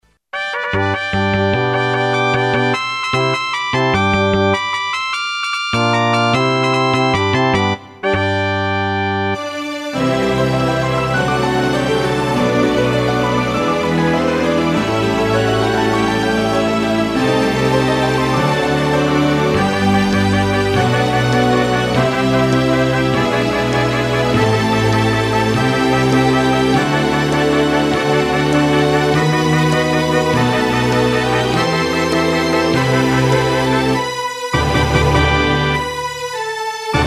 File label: instr